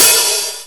hihat, Linn Drum LM1

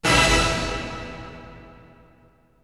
Hit; ZERO G - Datafile DISC 1

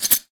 Bottle Tops, household rhythm samples Hits

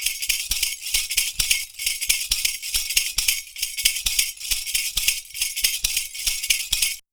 PERCU; Univers Sons; Raricussions

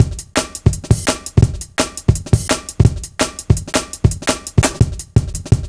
Live Wire, Drum Loops, 168.5, Jungle

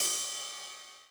Ride Accent Min Decay, 909

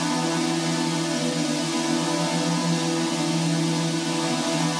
Pad Loop E, shimmer and sparkle; Pad Loops

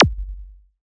Cwejman S1 Zap right

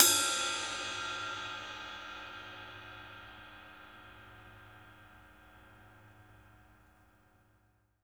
RIDE, Drumworks; Sampleheads; New York City Drumworks VOL-1

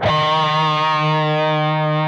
PRS PINCH, Guitars

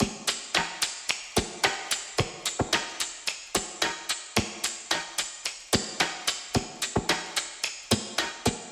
Foley Loop 110BPM, Drums; Antidote Advent

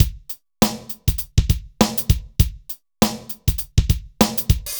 dry Loop, BPM 100

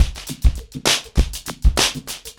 100BPM, ACOUSTIC DRUMS

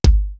kick in; East West; Global Sounds

